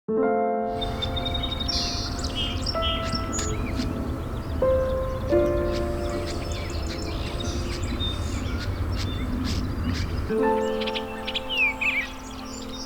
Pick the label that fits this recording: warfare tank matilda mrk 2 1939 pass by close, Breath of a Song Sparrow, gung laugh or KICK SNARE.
Breath of a Song Sparrow